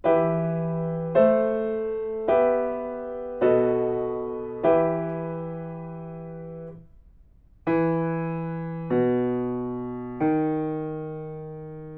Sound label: melodic fragments; eartraining